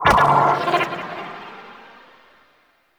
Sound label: Anima Dmg Reduc Aura End Burst; Warframe; Anima Animus